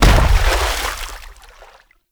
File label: Infested Step Water, Enemies; Warframe; Femur